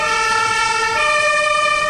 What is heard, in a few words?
police